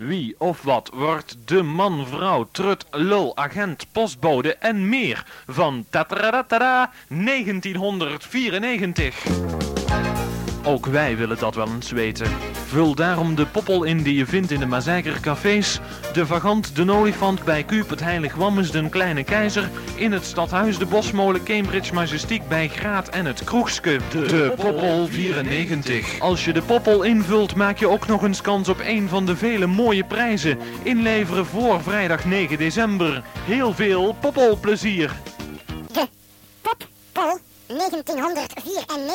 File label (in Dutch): Promotiejingle; Pop-Poll 94